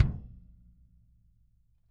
KICK, Drum Kits

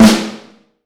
Snare Drum; Q Up Arts; Johnny.C's Vintage Snare Drum; 6.5X14

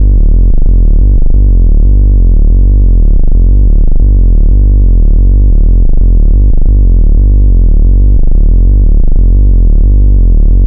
Synth Bass

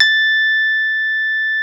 A5, synth pluck; Music Store - Claudius Diemeter Schwerlos

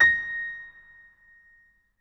MF74 R, Acoustic Piano; InVision Interactive; Miroslav Vitous

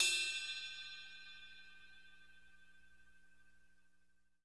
CRASH BELL

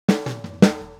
Boogaloo - Snare, 82 BPM; Drums; Intro; Sonic Foundry; Drums from the Big Room The Mixes

